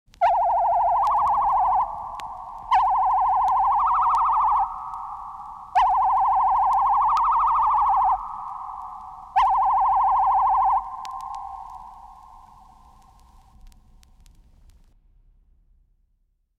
Science-Fiction Mikronenstrahl

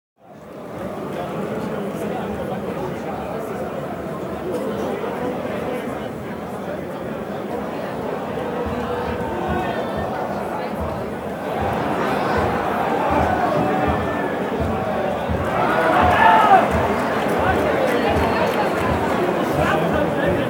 Stadion unter der Tribuene

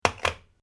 brett ablegen

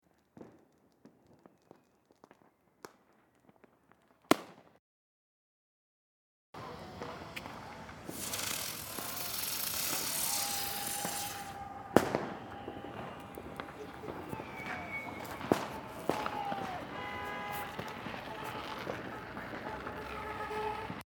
Silvester Hupen Menschen

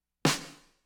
Drums Percussion Snaredrum

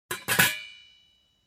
Deckel

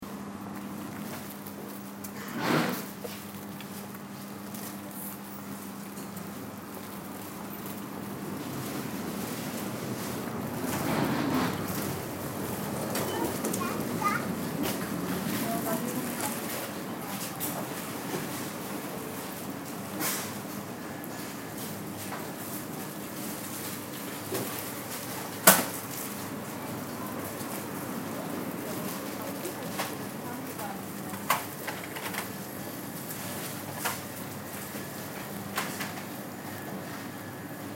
Supermarkt

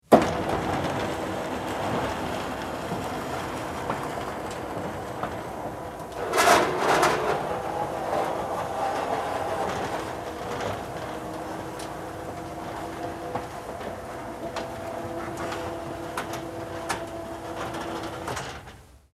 Lagertor schliessen

